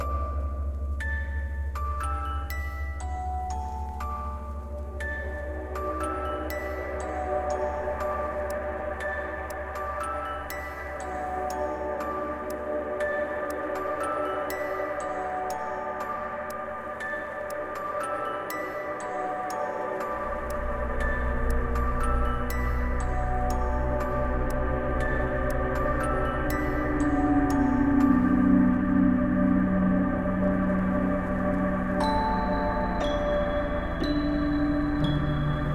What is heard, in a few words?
Music Menu; Game rip; MiSide